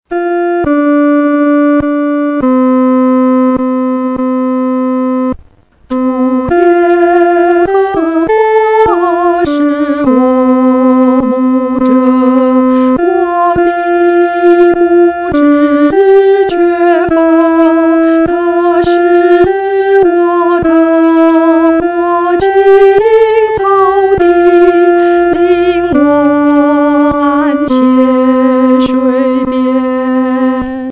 独唱 第二声; 主是牧者

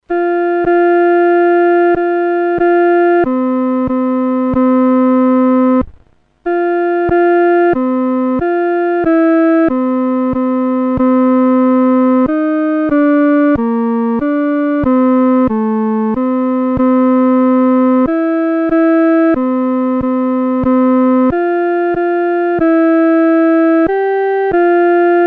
独奏 第二声

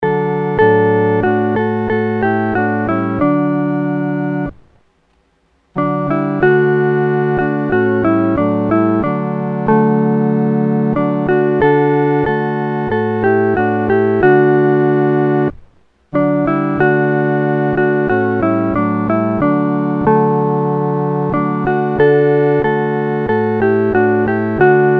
合奏 四声部

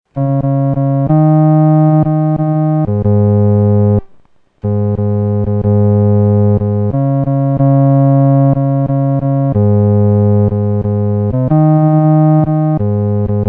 独奏 第四声

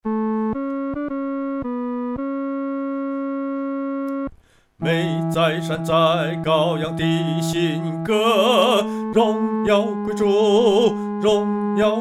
独唱 第三声

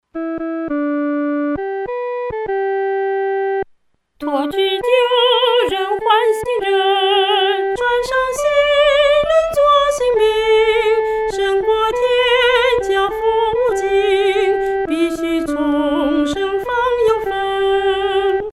独唱（第一声）

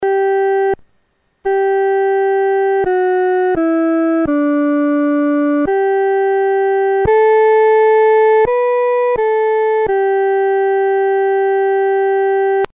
阿门颂 独奏（第一声）

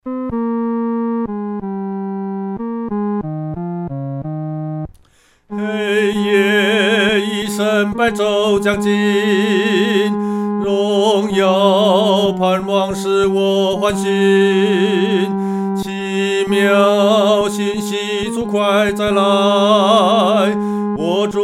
独唱 第三声; 主必快来

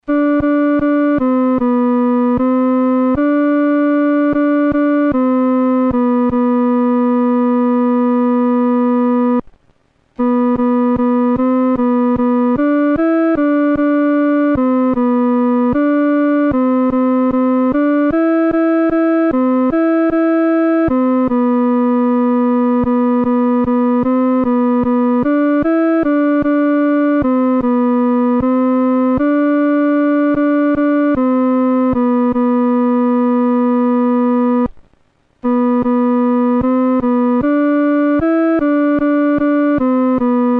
独奏 第二声

